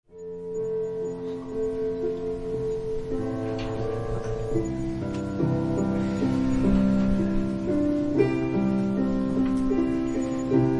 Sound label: Piano prelude; Surrender